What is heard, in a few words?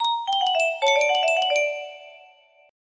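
alarm, music box melody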